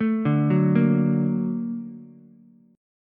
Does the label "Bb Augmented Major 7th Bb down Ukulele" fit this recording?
Yes